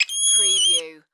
Spider Monkey Howl

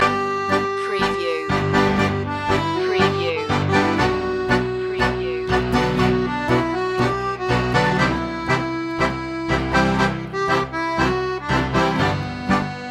tango loop

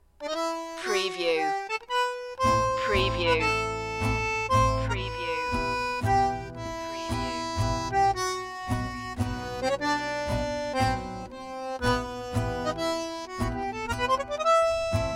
sicilian roots accordeon and guitar only

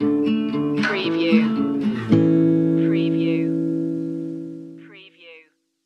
walking home stinger

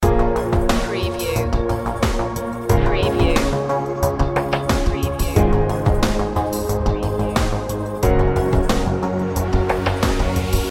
true values loop

